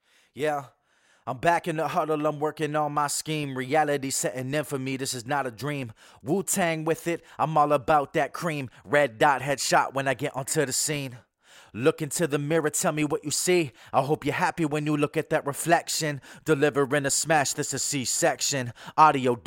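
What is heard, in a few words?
hip hop, 85, D minor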